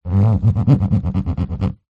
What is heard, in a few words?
flight case hand finger rub scratch fast top metal; foley